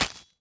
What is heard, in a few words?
sword hit dirt; weapons